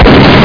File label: shotgun, weapons; matrixhl